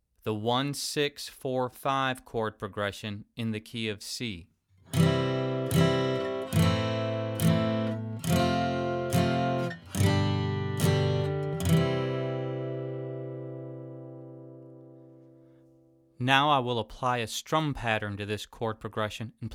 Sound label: 1 6 2 5 Chord Progression